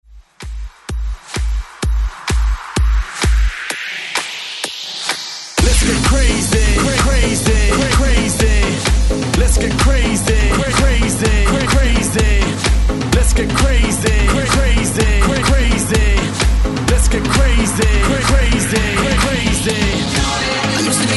128 Bpm

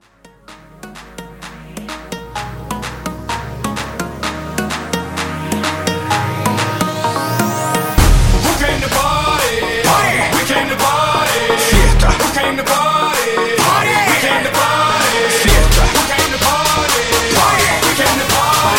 Fiesta Latina Hybrid 128; Cinco De Mayo

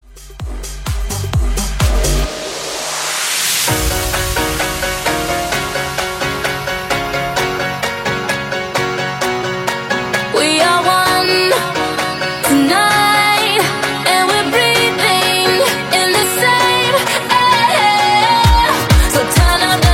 Bass House 130

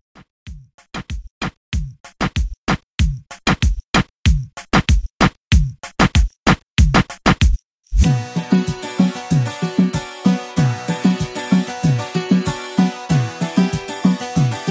Dance Moombah 95Bpm

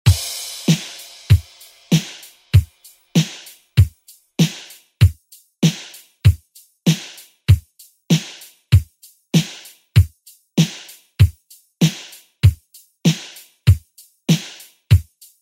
Quick Kutz; DJ Mhark

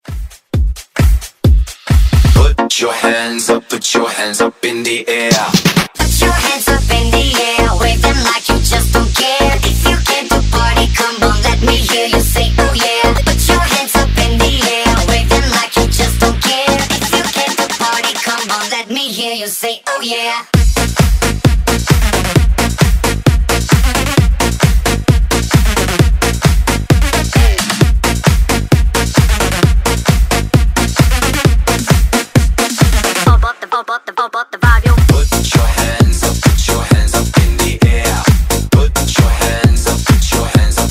Dance Redrum

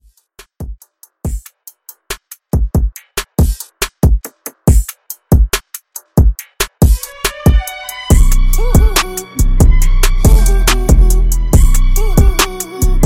Latin Hip Hop Redrum Intro Outro; Remixfreakz

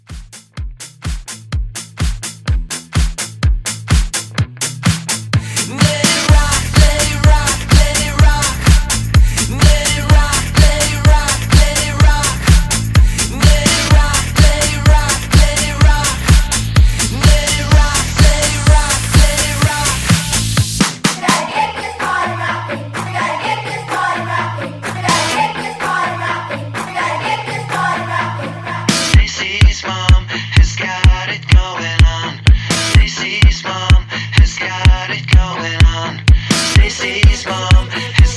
Rock Hype

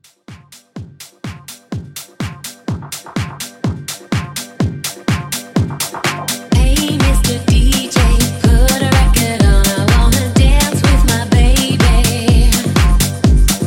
House Mixshow 125

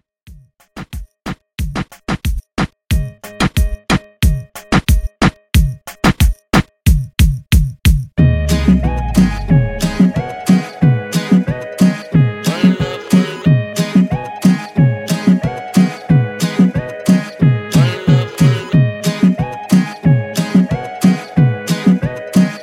Latin Pop Moombah Edit 91Bpm

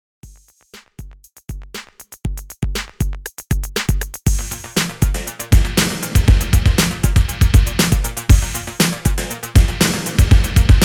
80S REDRUM 119 BPM CLEAN

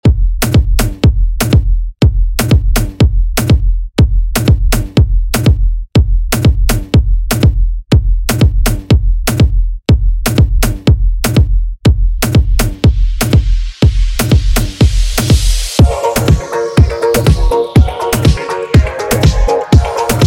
Afrobeat ReMix 122Bpm

